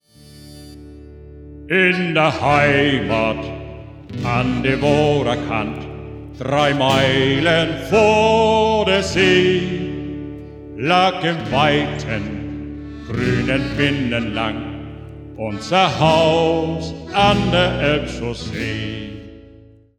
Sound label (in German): Shanty Chor